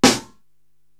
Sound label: SD Ambi Ring, Korg TR-Rack drum machine